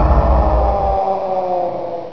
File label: die; svencoop; legends